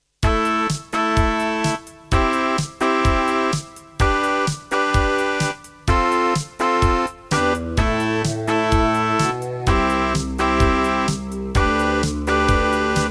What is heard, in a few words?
Yamaha TG100; raptor call of the shadows